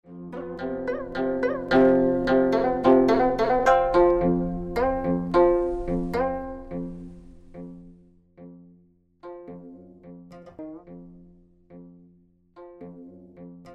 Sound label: 25-string gayageum